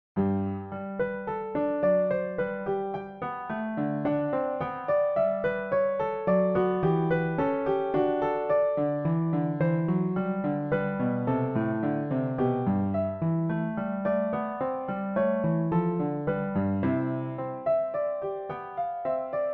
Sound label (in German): Klavier Fuge